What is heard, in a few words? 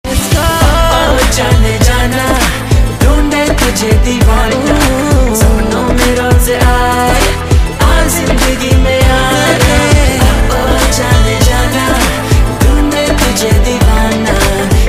hindi song